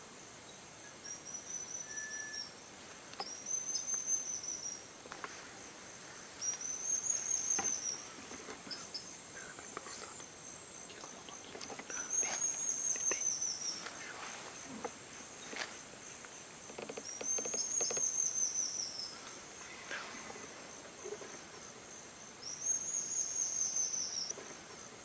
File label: Rio Madeira stipplethroat; پرنده گلونقطهای ریو مادی